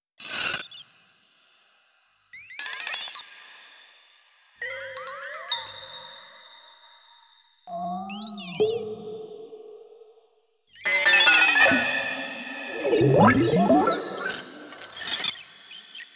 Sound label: grain space